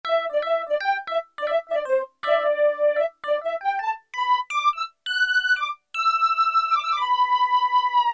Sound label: ELEC FLUTE, Casio VZ-10M; Internal factory combi